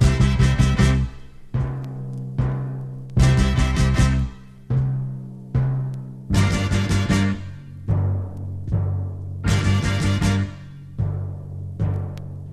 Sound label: horns; loops; Phonky